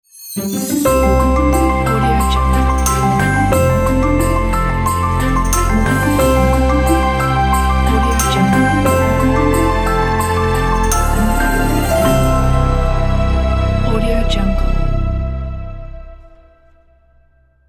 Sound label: logo christmas pack